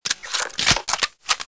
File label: scout reload